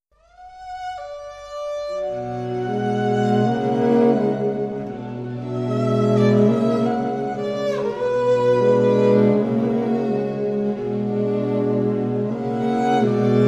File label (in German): streichquartett